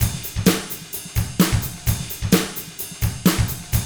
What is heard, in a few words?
HARD ROCK, Drums